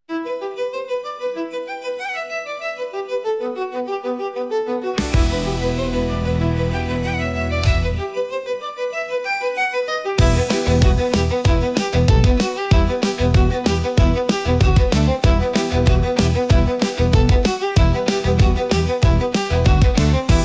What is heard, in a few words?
Classical Crossover